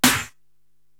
SD Pulp Rock, Korg TR-Rack drum machine

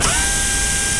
AIR WRENCH; InVision Interactive; The Piano

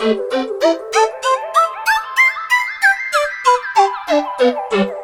Chillpeggio, Keyboards

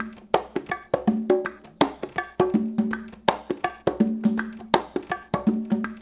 loop, congas; InVision Interactive; Percussion Slam

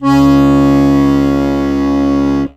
HOHNER, akkordeon; Best Service; Alpine Volksmusik

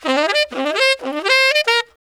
SAX LICK, BRASS LICKS; Ueberschall; Jam Box

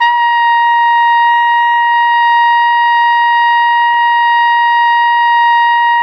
Flugelhorn; Roland LCDP12 Solo Brass

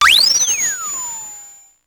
Drum, Electro-Harmonix Spacedrum

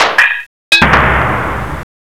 power elekit; Rubber Chicken Software Sample Bank

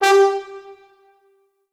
Orchestral Brass

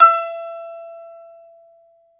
BAGLAMA E0JL; E-MU Producer Series Vol. 7 – Old World Instruments

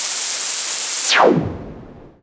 Noise Hit

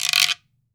GUIRO, Cuba; AKAI S6000 CD-ROM - Volume 5

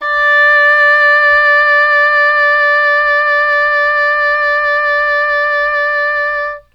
EH D5, ENGLISH HORN; Q Up Arts; Denny Jaeger Private Collection

